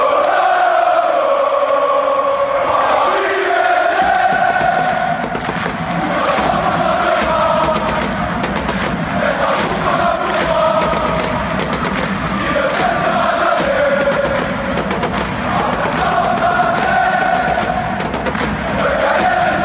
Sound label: paris sg tous ensemble on chantera fanchants